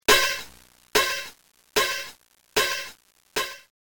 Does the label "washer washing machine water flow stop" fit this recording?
No